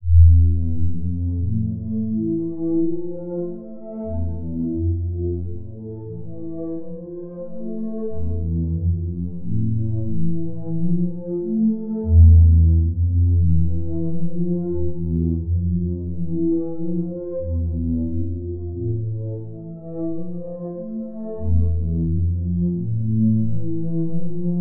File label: Drama Rama Loop